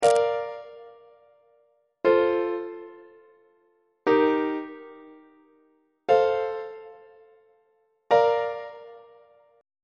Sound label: b flat major inverted iac on treble clef